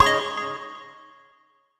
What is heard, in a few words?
shooting C#; Chill City; Popslinger